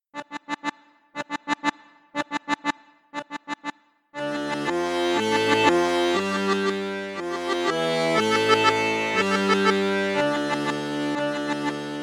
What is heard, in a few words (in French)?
registre basson, accordéon; coope armoniche diamante 805